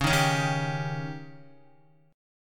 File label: Db Suspended 2nd Flat 5th Db down Guitar; 4F,4A,5C,5G,5C,6E; strummed